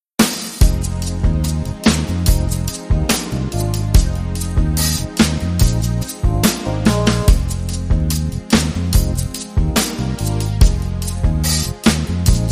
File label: Sax Underscore